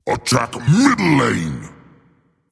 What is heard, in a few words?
Attack; Smite; Xing Tian